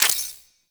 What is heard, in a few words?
Railjack UI Mod Install C; DE; Warframe; Railjack Player Ship